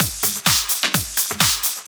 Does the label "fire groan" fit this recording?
No